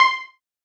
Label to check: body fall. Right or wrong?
Wrong